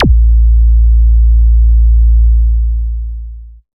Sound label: thump